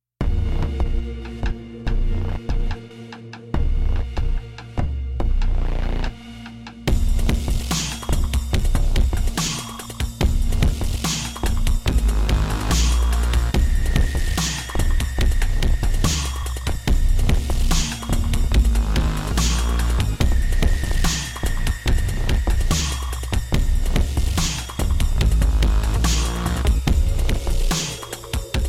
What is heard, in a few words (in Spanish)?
Fx Accion; XantaFe